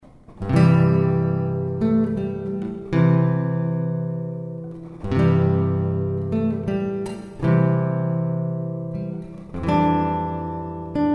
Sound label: Silent Night guitar; Seasonal